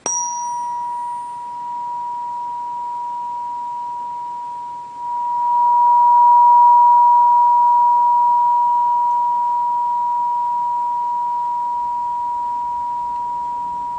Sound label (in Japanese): 963 Hz, 音叉; 第7チャクラ 白; 高次元、宇宙意識と繋がる